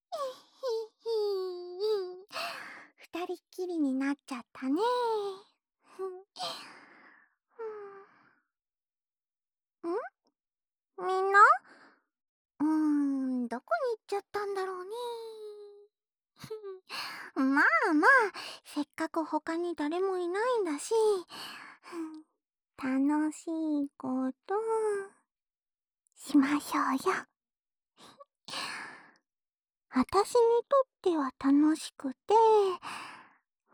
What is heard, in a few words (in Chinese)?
即兴掏耳（右耳）, ASMR